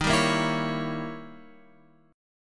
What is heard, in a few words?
D sharp 7th Flat 9th; D#7b9 Chord {11 10 8 9 X 9} strummed